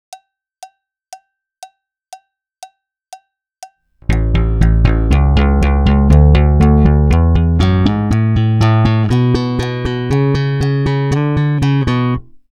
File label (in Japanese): サムピング練習フレーズ